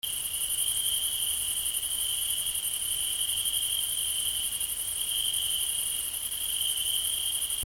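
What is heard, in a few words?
night and crickets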